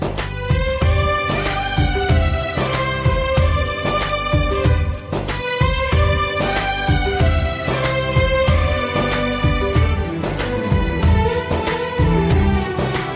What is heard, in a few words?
Instrumental Tone; from Baahubali -The Beginning Movie